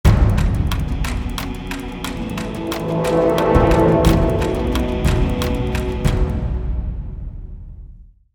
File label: Stinger; Patchwerk